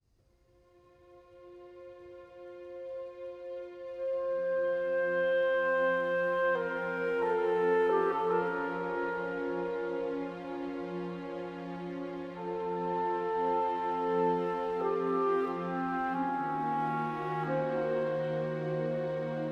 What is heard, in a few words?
94bpm 6-4; Planet of Lana; Grave Full Mix